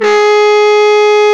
SAX Baritone SAX, FX

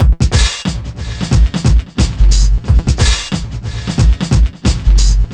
delay infecter 090bpm; Zero-G; Downbeat Dance Grooves

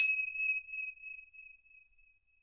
Bells Chimes; NorthStar - Global Instruments VOL-2

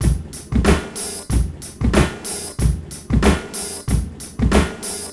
Effected loop 93bpm, Hip-Hop Street Beats; Sonic Foundry (Sony Creative Software); Hip-Hop Street Beats Construction Kit; Street Beats by Poogie Bell

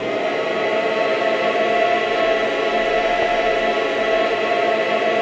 AVANTE G, Voices

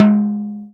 J T 2 MF, TOM JAZ

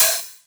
Open HH, Cymbals

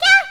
Dun Dun Dun!; SNES; Rocky Rodent

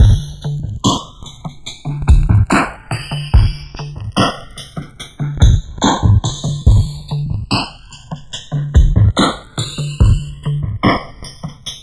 FILTERS; USB Soundscan vol.35; AKAI

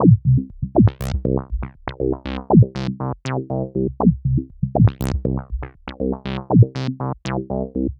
Bassline, Prototechno

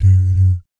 BS D-D, Vocal Jazz FX; Spectrasonics; Vocal Planet; JAZZCOMBO

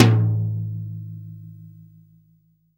TOM, REAL DRUMS, SWING KIT

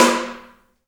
HAIRCUTS, KENYA DRM, Heart of Africa; Spectrasonics

